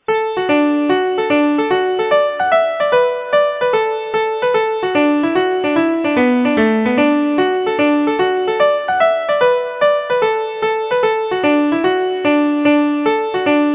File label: hornpipes